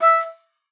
E5, OBOE; Xsample VOL-6

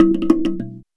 Box Drum Hunt, Worldly Rhythms; Sonic Foundry (Sony Creative Software); Ma Ja Le Ethereal Textures

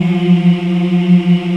VOX AFMM, VOX Afro Mms, Africa

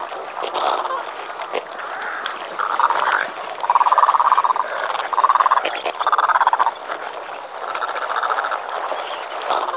hot herons, hotlands; Zero-G Ambient 1

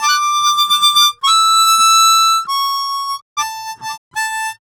Harm 098bpm A, Harmonica

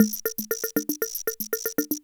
Loop, classic machines, rhythm77

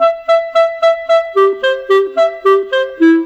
Clarinet, Cinematic; Sonic Foundry (Sony Creative Software); Orchestral Series VOL-3

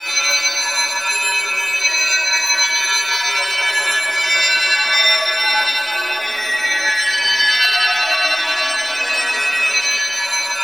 PEN PAD, BELL ATMOS; Zero G; Malice In Wonderland

